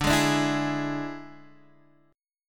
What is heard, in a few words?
D Suspended 2nd Flat 5th D Guitar Standard; 10,11,12,9,9,x; strummed